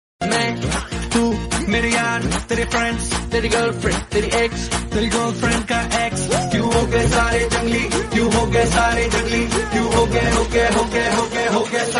Happy Birthday song Hindi

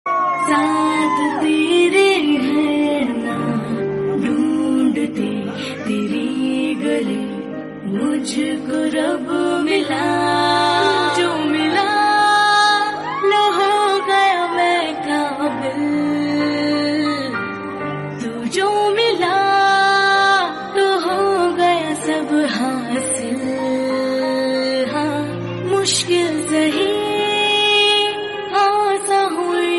Mother Baby Birthday Song Hindi

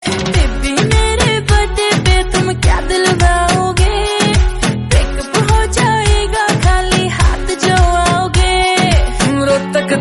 Birthday Haryanvi Song

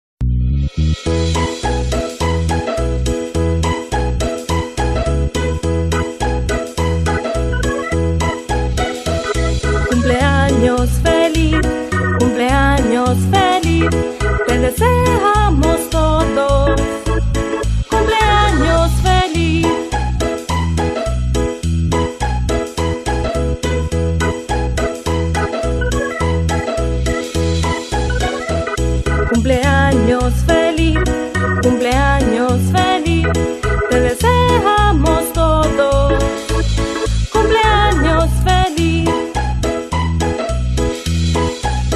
Happy Birthday Spanish Version; Happy Birthday Song in Spanish Version

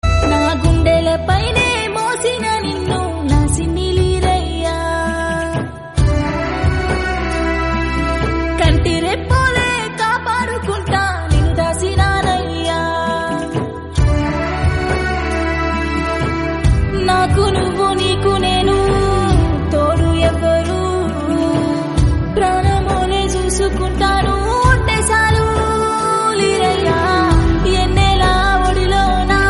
Birthday Telugu Song

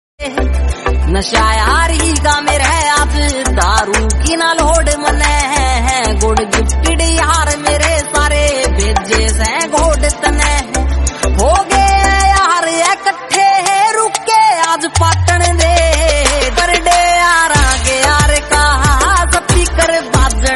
Birthday tune Haryanvi

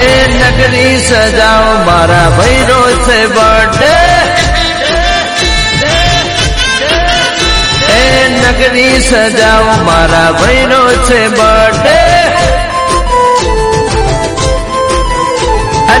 Birthday Song

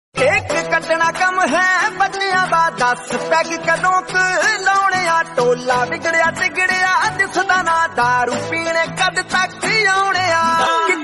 happy birthday Punjabi song

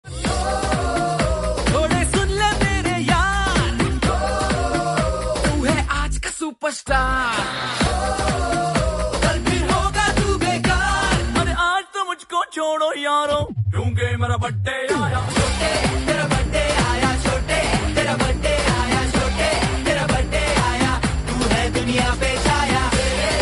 Hindi Song

